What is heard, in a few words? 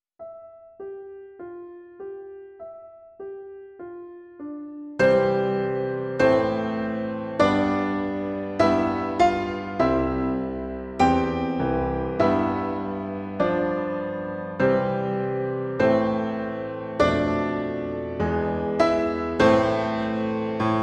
easy piano duets